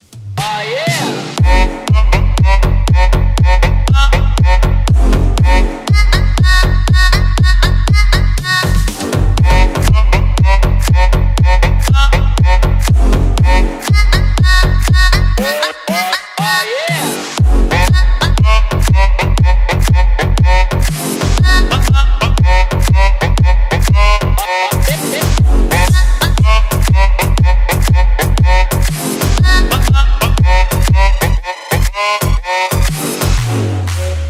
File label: bounce slow